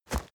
step leaves wet sprint single; schritte

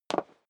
step high heels wood parquet single stair down; schritte

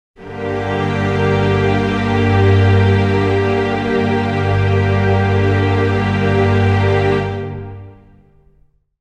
string chord g minor

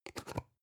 spice jar big scratch close soft; industrie wirtschaft; gastronomie

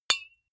deodorant hit hard, metall; maschinen; soundpool

